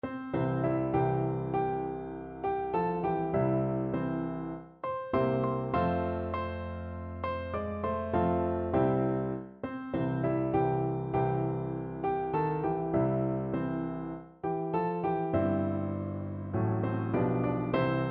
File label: to follow jesus, piano duet